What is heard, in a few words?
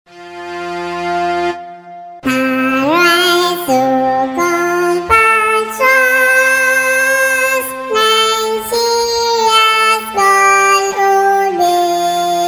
Nigeria National Anthem song for kids